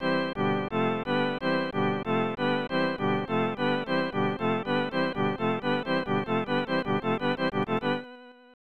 Stadium Organ; Hit The Ice; SNES

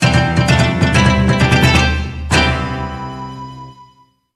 Jingle Lets go

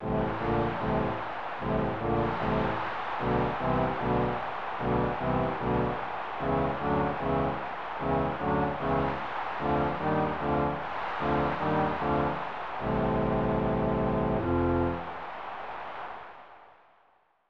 Baseball Fanfare; Tecmo Super Baseball 1994 SNES

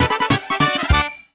Jingle happy; game builder garage 2021 switch

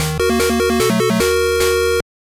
Jingle; Pocket Densha 2; gbc

